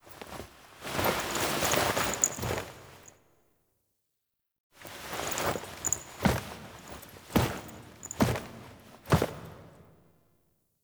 Foley; Lotus Reveal; Drifter

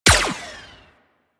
Laser Gun Fire; Shield Osprey

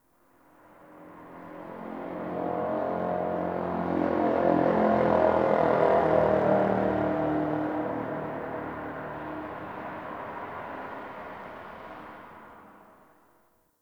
Distant Traffic A; Warframe; Ambience; NineteenNinetyNine

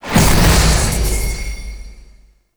Puzzle Button Timer Failed; Warframe; Duviri; Medusa Puzzle

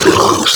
Flying Mouth Pain; Warframe; ManInTheWall

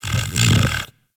Kubrow Tame Sleep Idle Snore Fidget A; Warframe

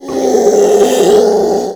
Infested Ancient Strike Scream; Warframe

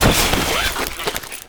Military Backpack Destroy; Objects; Storage Container; Warframe

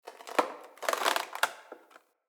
Amb Dead Tree Texture; Ambience; Mendicant Area

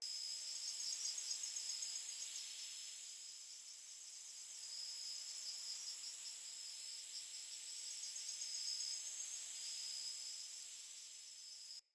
Insect Loop Exterior

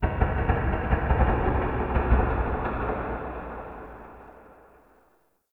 Wooden Clicks; Warframe; Shadowgrapher

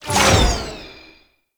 Mirror Defense Rune Shot Cancel; Warframe; Entrati

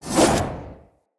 Long Sword Whoosh; Weapons; Mother

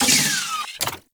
Charge Up Stop; Crp BFG

